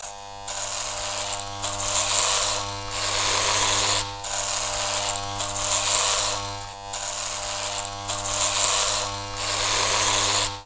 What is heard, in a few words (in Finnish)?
partakone